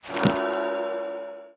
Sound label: enchant, enchantment table; minecraft